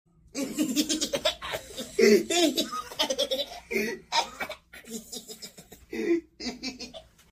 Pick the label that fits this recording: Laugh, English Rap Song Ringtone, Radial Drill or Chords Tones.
Laugh